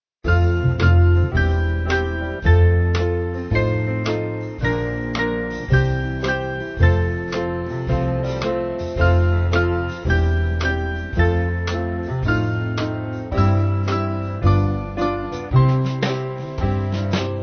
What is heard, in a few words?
band, Music Backing Files for Churches; At The Name; Wye Valley